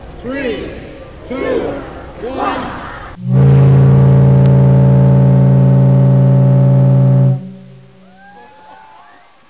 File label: titanic whistle